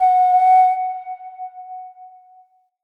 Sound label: Flute F Sharp; Player Instrument